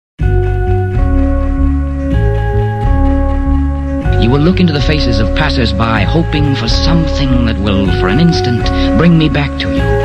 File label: sped up